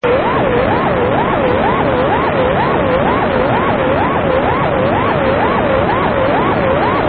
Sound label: relay